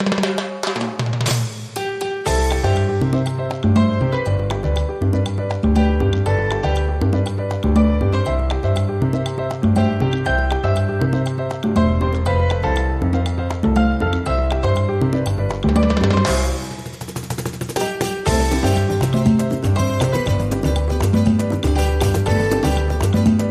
Salsa Happy Birthday

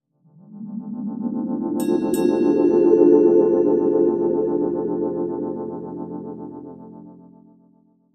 Sound Logo

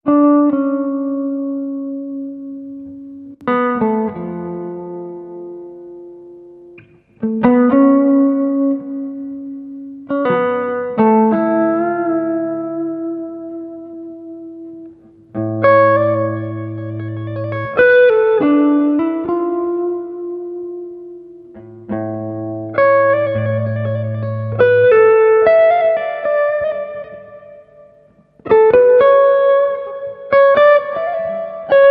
Guitar Only

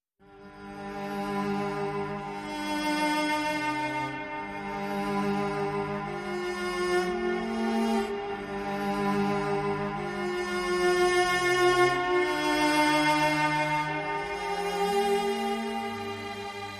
Topline Strings Only Mix

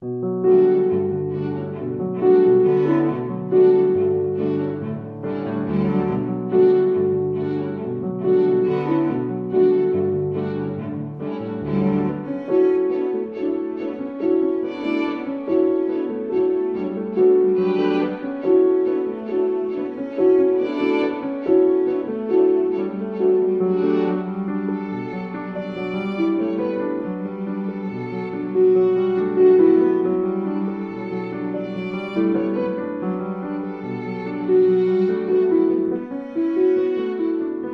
With Strings

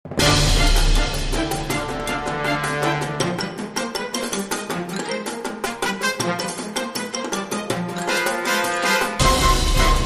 Dramatic Short Edit

